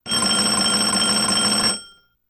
Doorbell Ring Lower; door bells

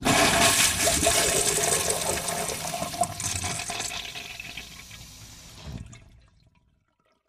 toilet flush

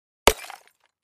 Clay Chimney Vent Hit, concrete & brick

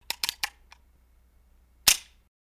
Revolver Draw, Cock, Dry Fire

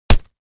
Body Punch; punches kicks hits bones

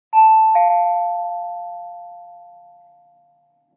Door Bell Chimes Ding

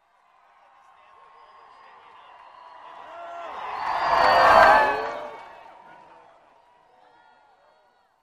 Arena Crowd Dopp By

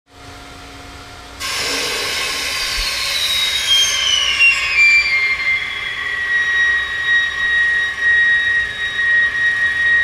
Air Blast Fast Heavy

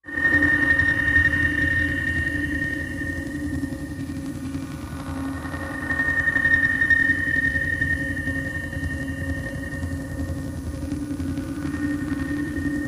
Core Alarm, energy beams

